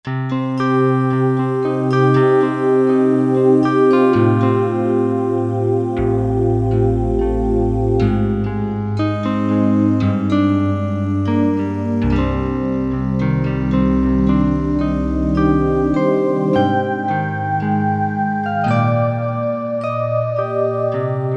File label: Magic Guitar